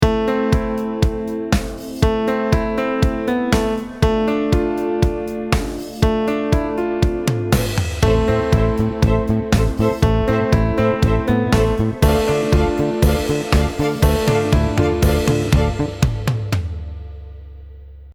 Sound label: Mix Buscompr